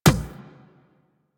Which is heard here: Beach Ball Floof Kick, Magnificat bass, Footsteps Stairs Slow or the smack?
Beach Ball Floof Kick